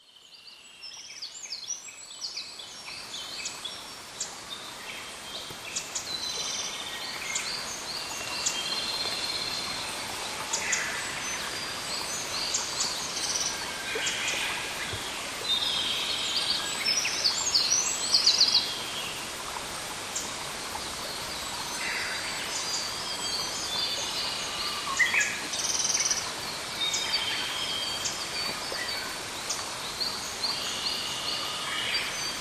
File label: murmuring